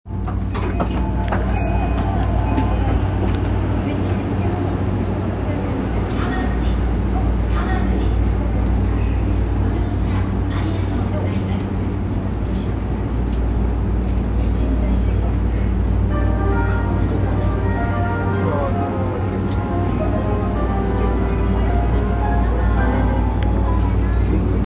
JR 山手線 田町 CCW, train sounds

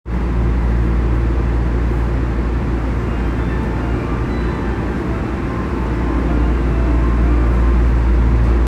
千代田線綾瀬南, train sounds

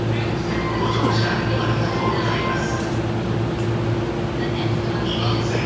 埼京線与野本町北, train sounds

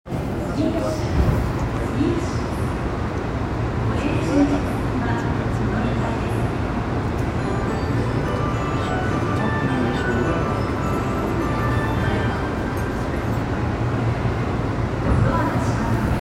半蔵門線住吉東, train sounds

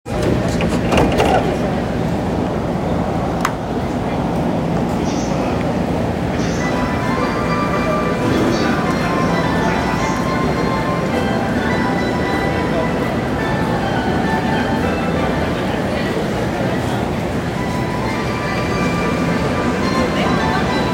JR 東海道線 藤沢 北, train sounds

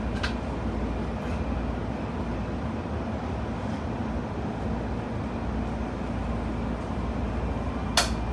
京浜東北線山手北, train sounds

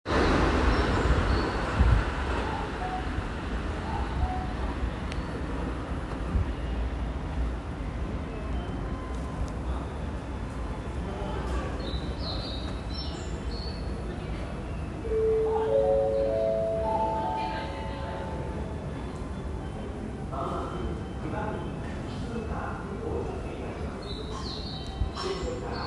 京急本線立会川南接近

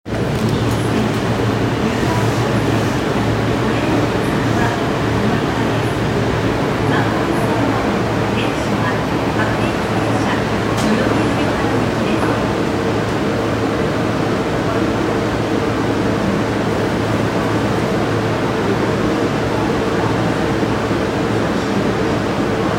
千代田線日比谷西

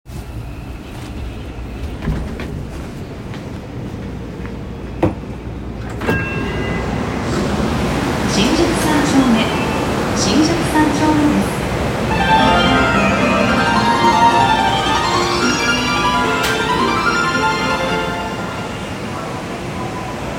副都心線新宿三丁目南, train sounds

